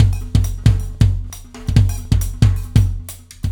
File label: Warp Drive Beat, Processed Drumkits